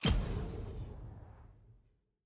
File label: nav button lobby click